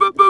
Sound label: TB BUBUB, Talk Box